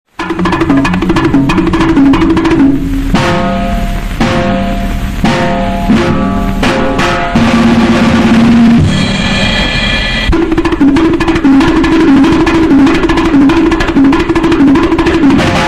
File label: Dance Music Instrumental